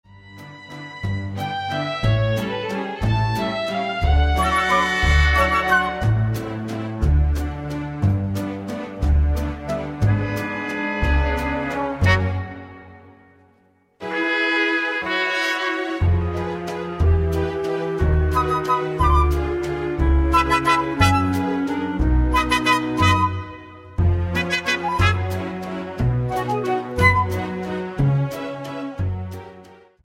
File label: Hooks Waltz